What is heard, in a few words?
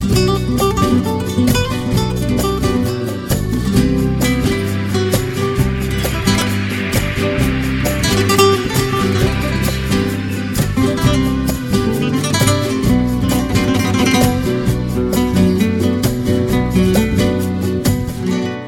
Romantic Guitar